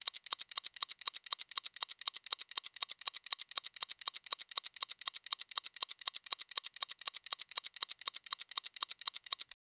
ultra fast mechanical sto, clockwork

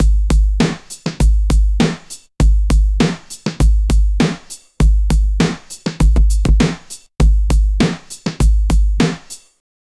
energetic entry, bollywood

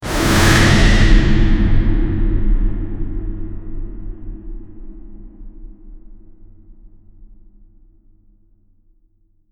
power dark impact game

